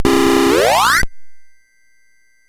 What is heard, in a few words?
Dragonworld sid MOS6581R4